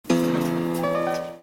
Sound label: dramatic